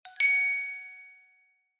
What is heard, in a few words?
multimedia correct ping tone; zapsplat